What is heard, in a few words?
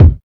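HSE-BD, house bass drum; X-Static Goldmine 1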